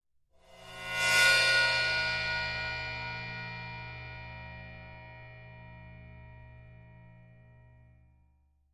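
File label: Old Ride Cymbol Bow close up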